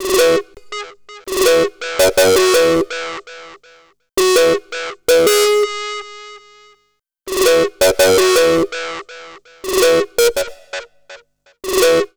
165 E, Guitars; Total Drum&Bass; 90 s Sample CDs

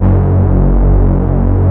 MATRIX 12, PADS; Masterbits; Vintage Voltage

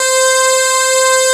JP 8 SYNS; Pro-Rec - Synthsonic

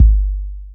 Kick, Trance

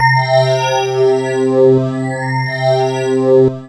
KORG WAVESTATION

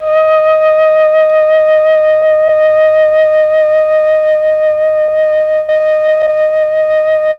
flute; ILIO; Propeller Island Legendary M400

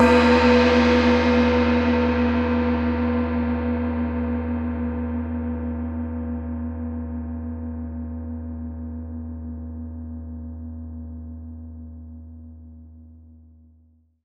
prc wuhan, MIXED GONGS; WORLD INSTR 2; WORLD PERCUSSION

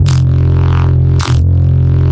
Reese Bass F; Antidote Advent

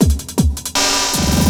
LOOP, 160 BPM, Drum & Bass; Ueberschall; Drum & Bass Resonance

